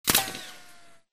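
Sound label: bullet light ballistic titan ricochet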